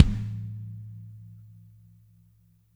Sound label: KIK; kick; Spectrasonics; Burning Grooves